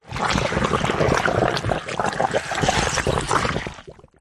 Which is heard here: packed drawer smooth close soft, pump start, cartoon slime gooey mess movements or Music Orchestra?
cartoon slime gooey mess movements